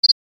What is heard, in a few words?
Login confirm beep